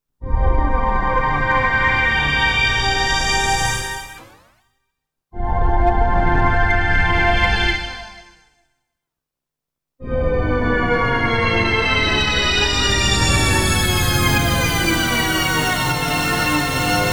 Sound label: PC3 A8; THX